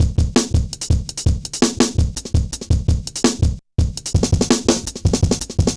Jazz Shuffle, Drum Loops; Jungle; 166.5; Zero-G Jungle Warfare 1, 2 & 3; 90 s Sample CDs

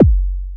Kick, Trance

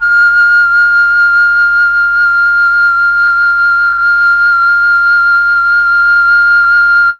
flute; ILIO; Propeller Island Legendary M400

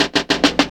fill; AMG; Now CD-ROM (Roland)

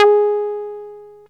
SOFT G#4; Best Service Alpha Dance vol.2; SOFT SEQ.1

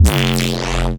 Reese Bass F; Antidote Advent

